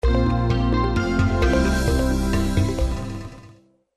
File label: logo stereo mix; psn